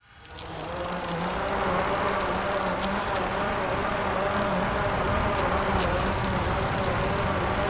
forward, bmx; gtav; vehicles

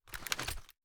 inspect; gmod

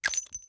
charge reload fast shell eject; gmod

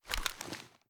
reload empty fast drum raise; reloads; gmod; iw8

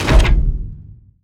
splash notify, user interface; gmod; mw

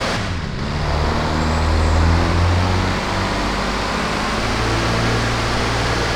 third, vehicles

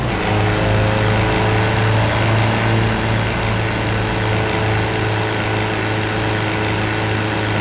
fourth cruise, vehicles; beetle

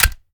fast reload magin; gmod

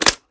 reload drum drum in; reloads; gmod

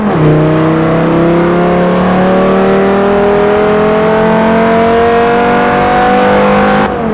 second, vehicles; 850r

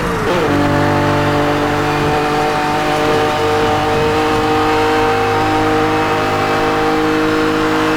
fourth cruise, vehicles

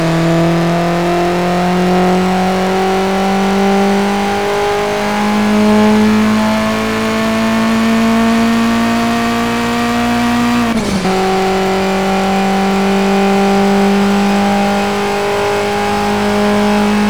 fourth cruise, vehicles; nissan silvia s14

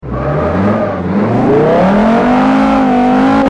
first, vehicles

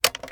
reload mag in; gmod; viper

